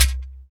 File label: CHEKER, ETHNIC PERC; Roland LA